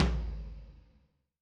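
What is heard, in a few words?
Low Floor, Alesis SR16 drum machine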